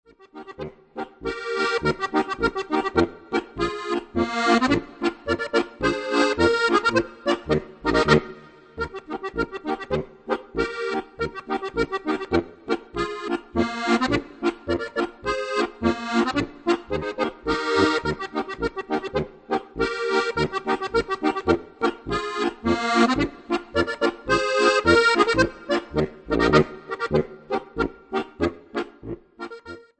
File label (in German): polka